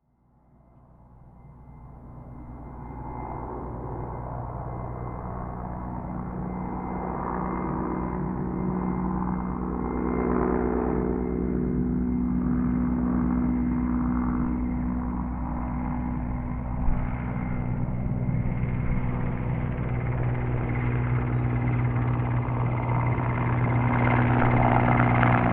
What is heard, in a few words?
helicopter